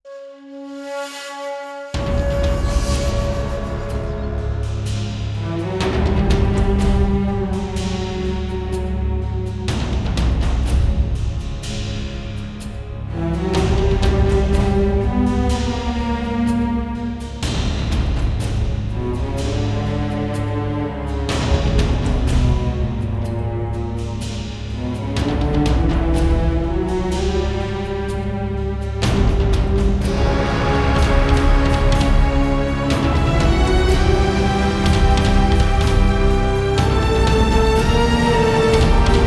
No Choir

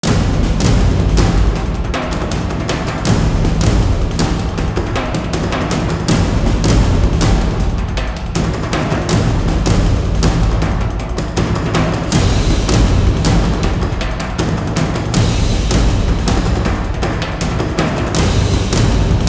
Rocket Hammer, Drums

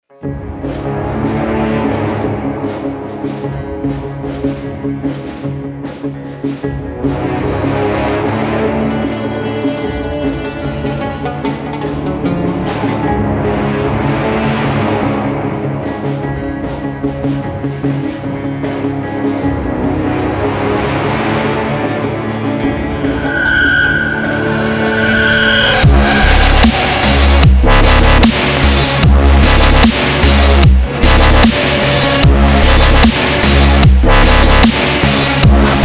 Dubstep Remix

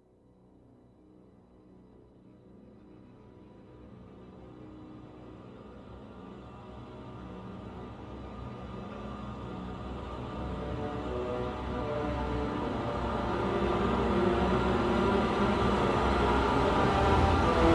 Orchestral Version

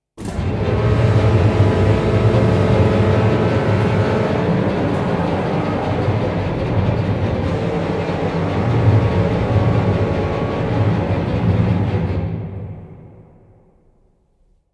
Hit And Static

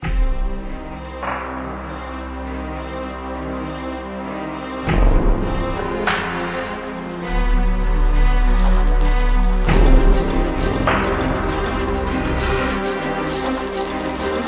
(Orch)

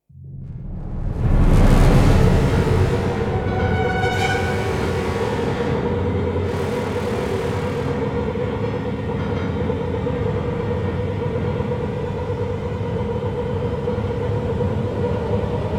Choir

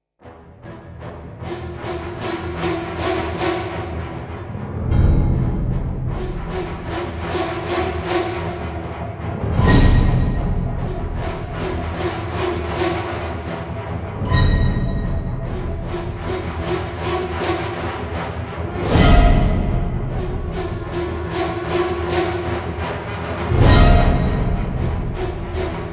No Choir

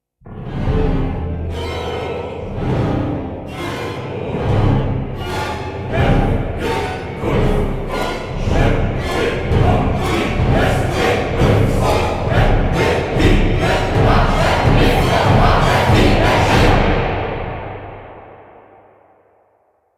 Choir

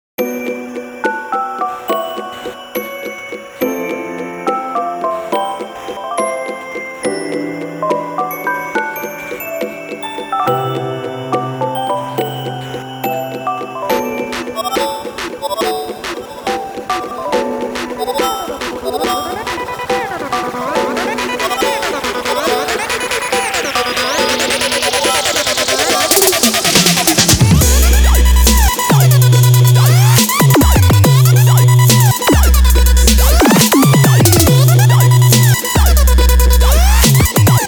instrumental

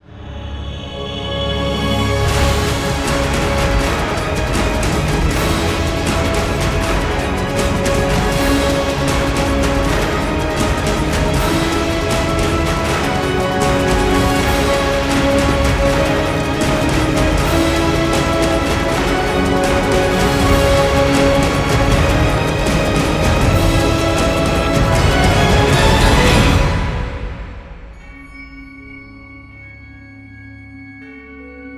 Alt Ending (No Choir)

